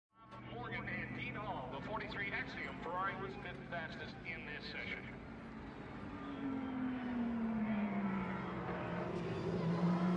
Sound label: Sports Car Race Pit